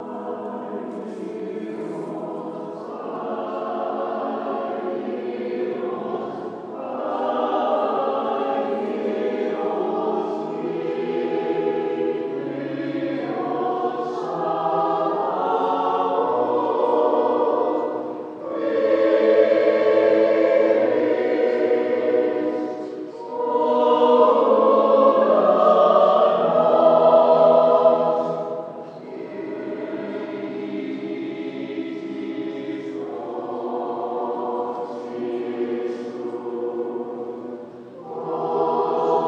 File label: holy trinity cathedral choir